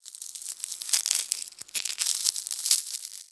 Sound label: wood crackle